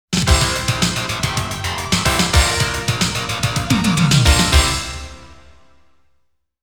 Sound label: Clear Demo (Stage Clear); music from konami arcade shooting cd box